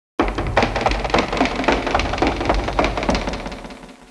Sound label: feet